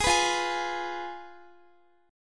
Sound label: F Diminished Guitar; strummed